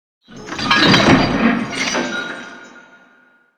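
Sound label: big chains sweetener close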